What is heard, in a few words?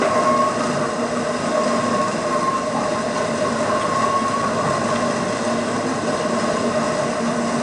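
CONVEYOR, INDUSTRY; 1000fx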